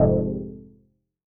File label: stab dispond F, Noise; stabs